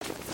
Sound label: Change Shirt, foley